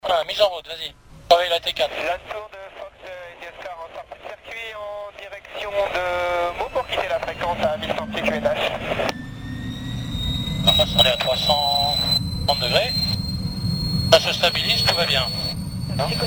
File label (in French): decolage helico; villages le lonzac; vues helicoptere